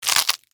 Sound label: Crunch Celery